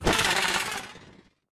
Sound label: Leap